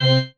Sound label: marimba grave; ゆめ2っき